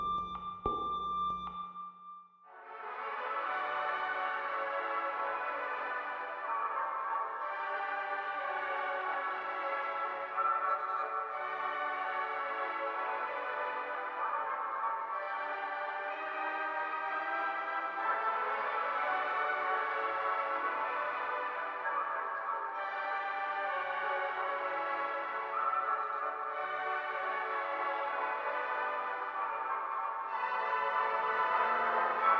Musique; procédure reconfinement

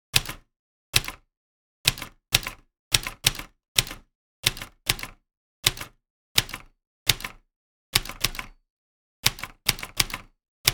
Machine ecrire

